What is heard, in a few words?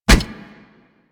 Kubrow Run Metal, Footsteps; Enemies; Warframe